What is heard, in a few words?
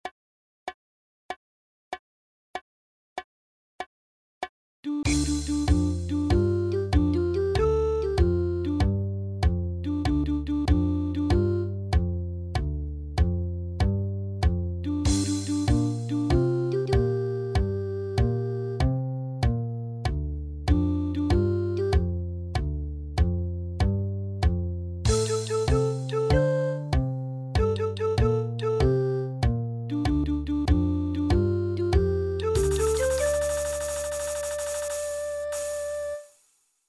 long short riffs, jazz; karaokejazz lesson 8